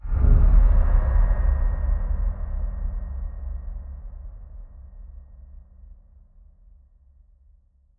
enemy assassination stinger

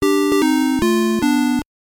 Player Incorrect - Jingle

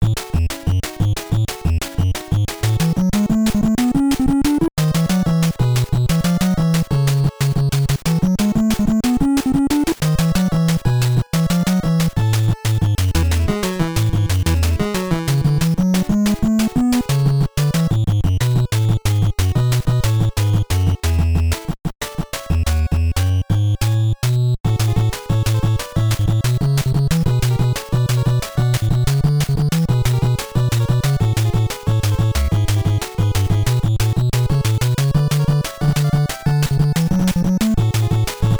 Timer Low; House of Wasser

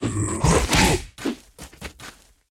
Daemon Spattack

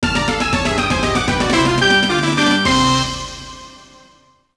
Lose; bully scholarship edition; Arc Flying Squirrel

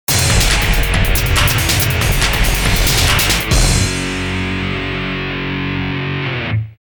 win; 1080 Avalanche

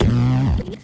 down test

